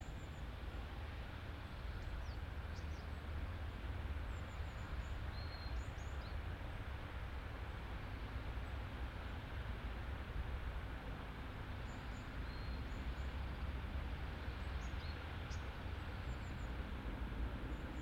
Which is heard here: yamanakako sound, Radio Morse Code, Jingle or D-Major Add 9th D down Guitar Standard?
yamanakako sound